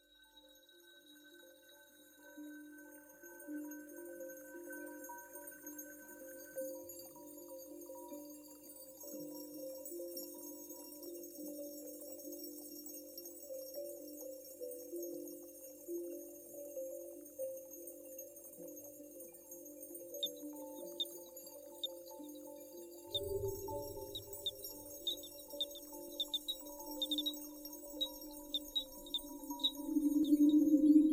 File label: Shared Soundscape; Yannick Dauby